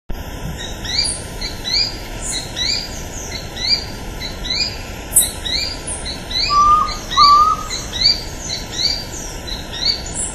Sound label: Synallaxis azarae; PN Calilegua